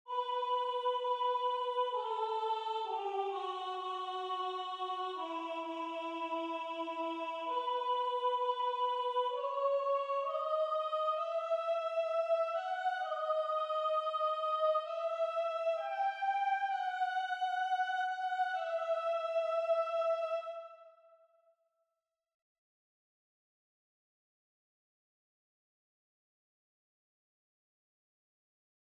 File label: Jesu, Mezzo Soprano; Buxtehude